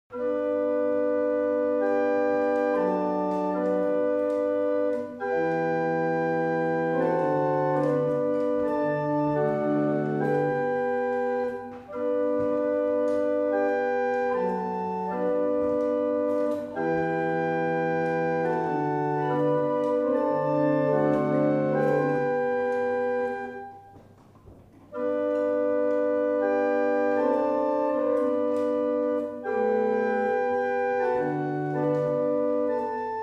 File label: Single chant Gregorian, barrel organs; Winwick